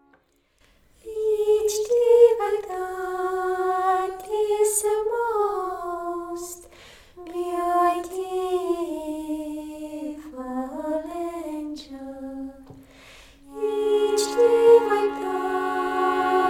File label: harmonium